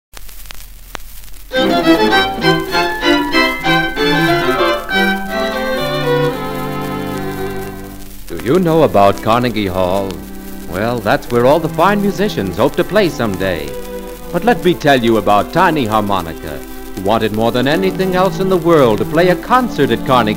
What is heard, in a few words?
tiny harmonica